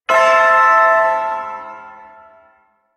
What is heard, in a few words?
Shawzin Pent Maj Chord; Warframe; Duviri Ersatz Shawzin